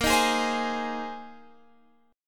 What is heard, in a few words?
A sharp 7th Suspended 2nd Suspended 4th, Guitar Drop D chord; x,13,13,13,13,16; down